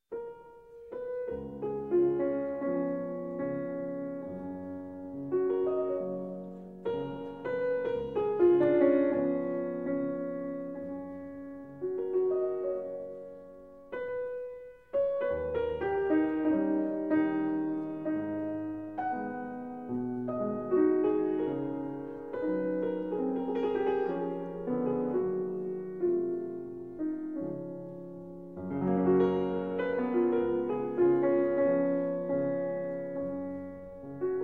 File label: Nocturnes, Larghetto in F-Sharp Major